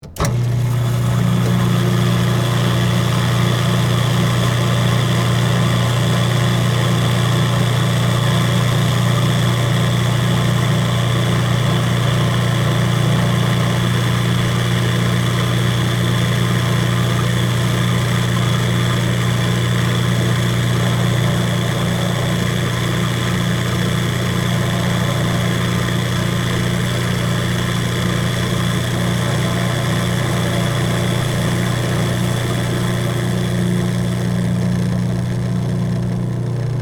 Household, Coffee, Grinder, On, Run, Off, Industrial, Restaurant; Appliance Sound Effects; BlastWave